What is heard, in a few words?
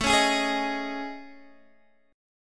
A Major Sharp 11th, Guitar, DADGAD